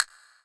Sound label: Korg N1R Castanets